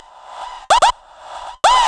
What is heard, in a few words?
House Loops, Vocal Loops House 128BPM